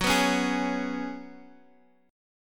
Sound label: Gb Suspended 2nd Suspended 4th